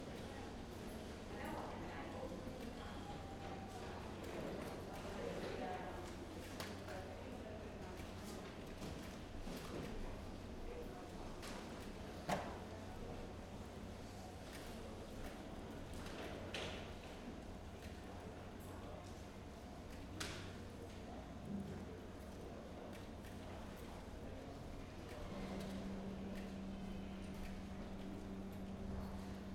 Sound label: Coffee Corner Calm